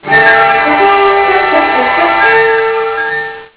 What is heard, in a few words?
fanfare